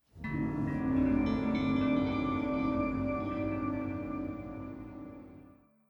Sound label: Music Jingle Alternative